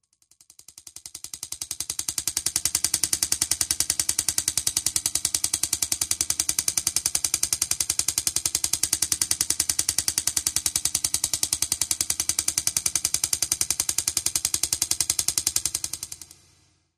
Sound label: Tapper Machine Tappin; machines winches